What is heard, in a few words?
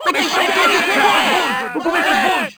burst, Crowd; French